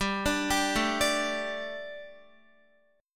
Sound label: G Suspended 2nd G, down, Guitar; Gsus2; arpeggiated